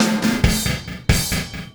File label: Ripple Perc